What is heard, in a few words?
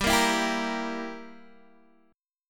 G Minor 6th, Guitar Open D